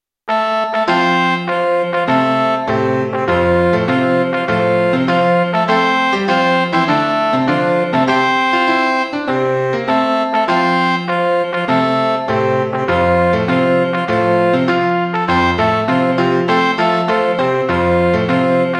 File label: Fanfare